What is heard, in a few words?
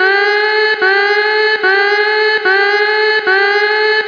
siren; Weather Watcher Live 6.1.23